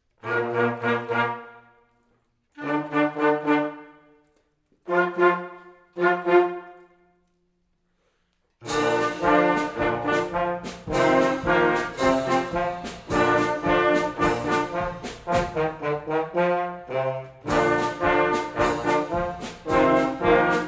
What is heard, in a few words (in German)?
erste polka fuer blaeserklasse